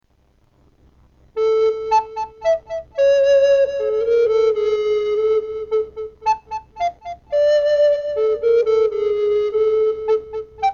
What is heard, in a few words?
La Cumparsita Tenor; MelBay